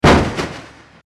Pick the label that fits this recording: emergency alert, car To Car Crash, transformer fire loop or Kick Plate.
car To Car Crash